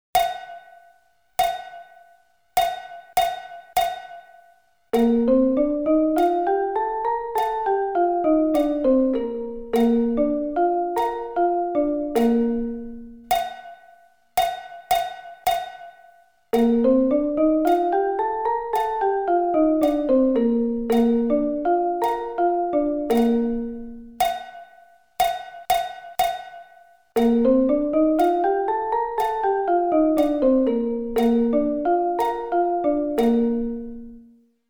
Bb Scale

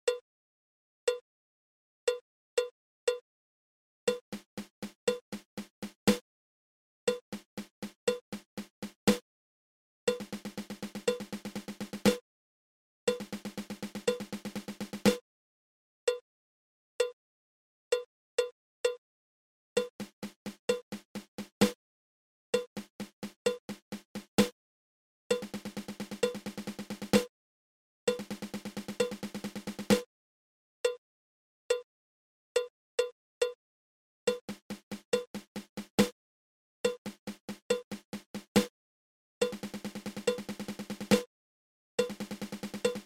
Seventeen Stroke Roll

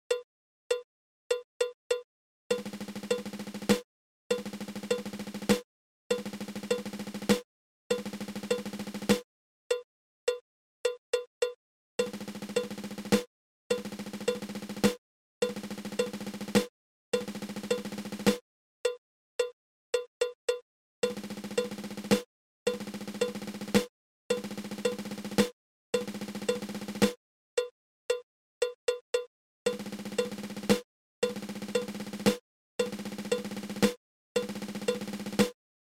Seventeen Stroke Roll

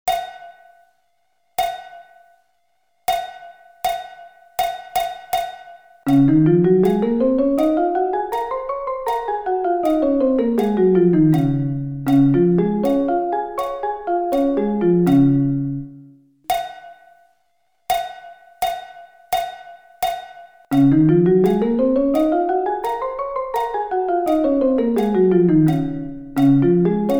Db Scale

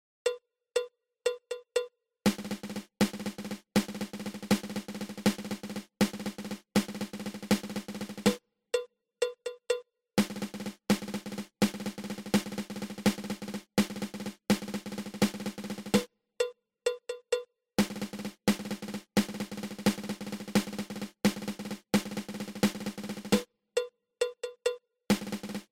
Double Ratamacue

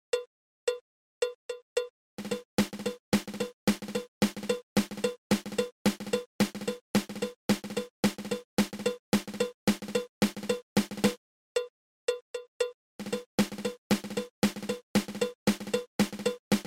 Single Drag Tap

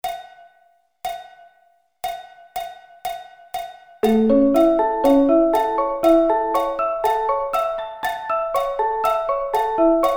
A Arpeggio Inversions

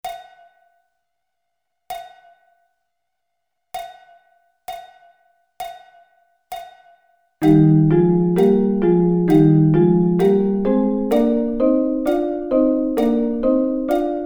Eb Double Stops

